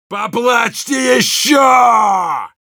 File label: yell, ru